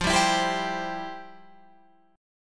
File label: F Major 7th Suspended 2nd, F, down, Guitar, Open F; strummed; JGuitar